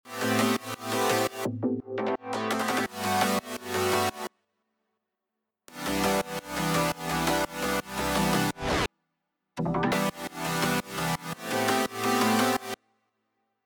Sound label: chord